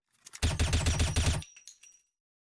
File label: smg